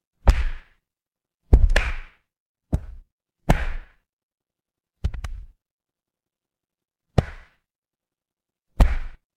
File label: ambience fireplace